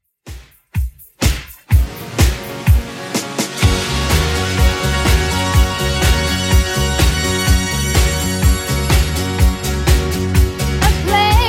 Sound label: 80s Redrum 125bpm